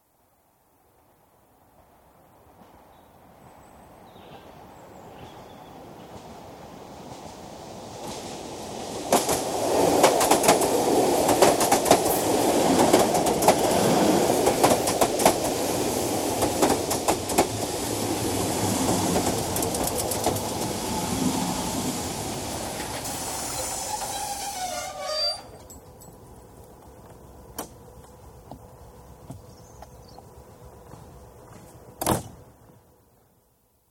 Electric Train, exterior, arrives at local station (left to center), doors; BBC